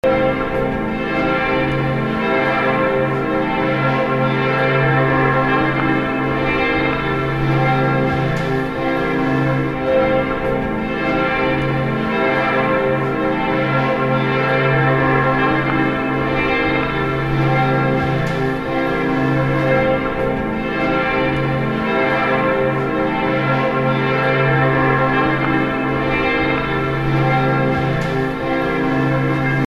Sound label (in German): Glocken Marienkirche Reutlingen; Die Glocken der Marienkirche läuten zum Gottesdienst.